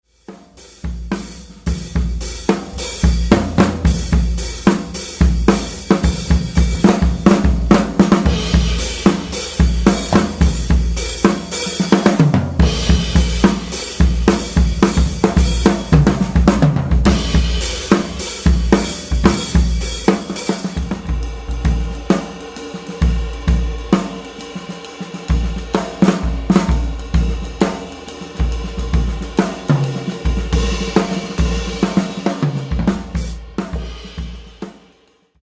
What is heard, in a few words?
big beat groove